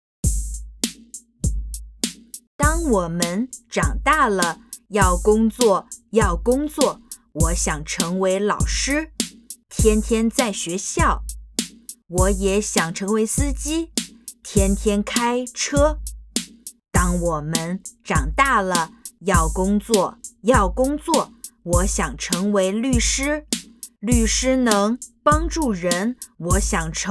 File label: Chant